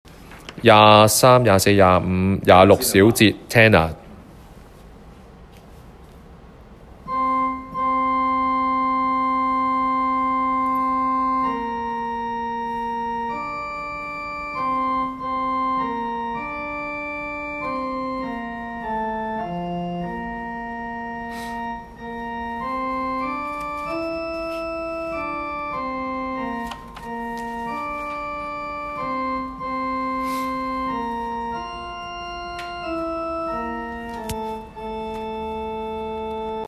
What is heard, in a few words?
Tenor